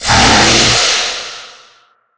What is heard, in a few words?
spider ouch strong